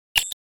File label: Digital button